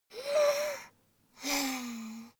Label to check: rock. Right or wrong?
Wrong